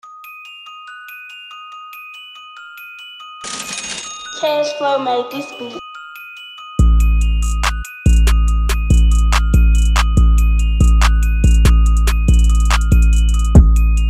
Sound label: Bells Beat Anthem, Instrumental